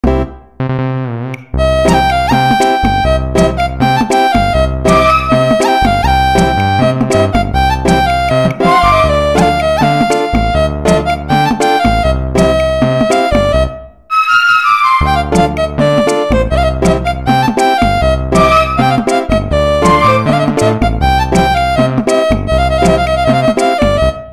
Nokia Arabic Ringtone Midi; ringtones

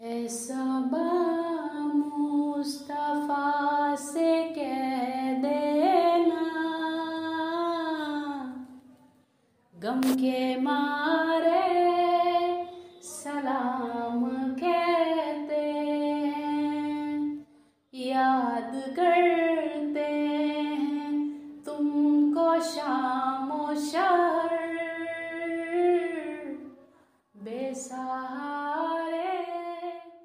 Female Voice Naat Ringtone

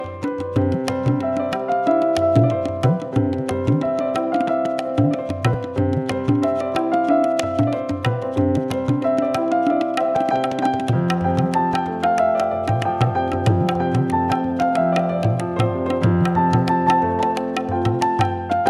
Instrumental Ringtone; Simba

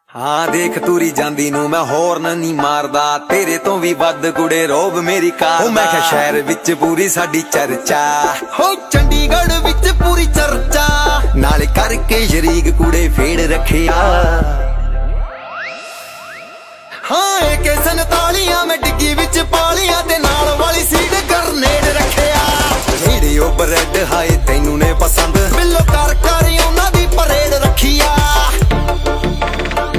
Punjabi Song Ringtone; ringtones

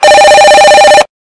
Very Loud Old Telephone Tone For Alarm; ringtones

